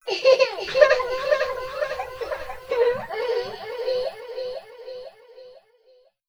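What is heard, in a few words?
warai kodomo, child laughing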